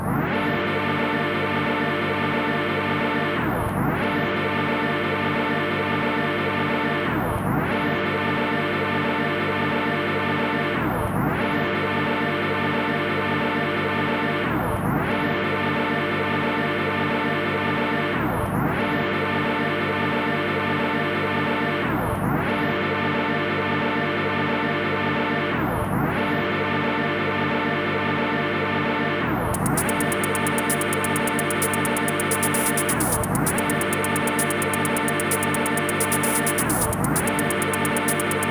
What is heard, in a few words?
steel ambience; Trackers; Modland